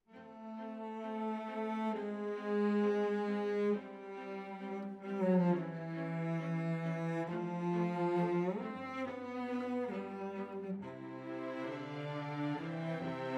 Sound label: Cellos